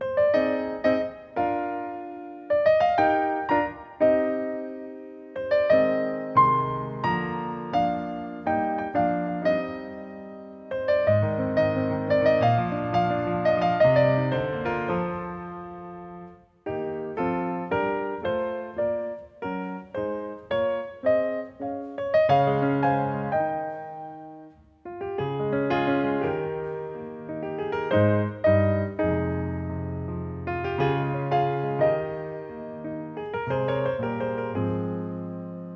ballad

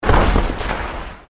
Rocks; Yet Another Pixel Dungeon; Android

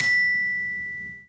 Note Grab Success; the princess and the frog

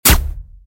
cast arrow; Endless World Idle RPG

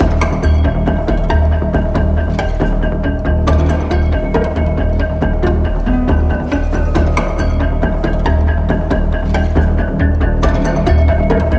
Combat Incidental; Wolfenstein The Old Blood

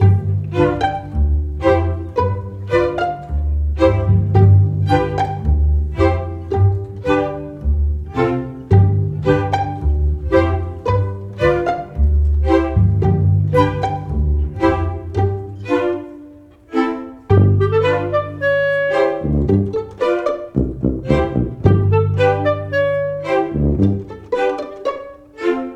Happy Loop

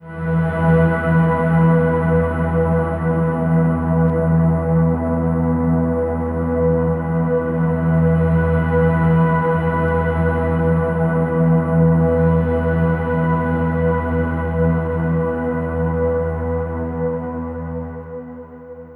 Overlay Drone

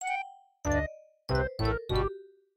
MIDI Animation stings

